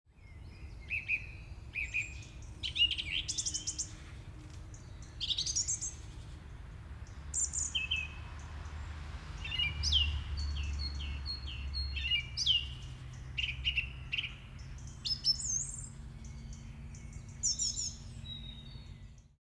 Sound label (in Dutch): sfeer